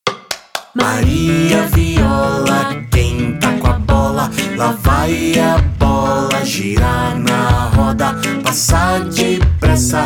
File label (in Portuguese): Cancao